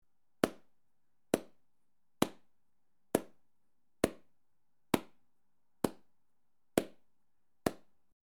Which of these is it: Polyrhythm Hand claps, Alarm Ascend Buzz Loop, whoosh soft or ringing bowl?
Polyrhythm Hand claps